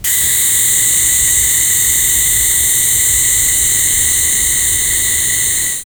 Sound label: smoke gas leaking; industrial